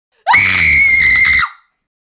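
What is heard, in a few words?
girl squeal; devushki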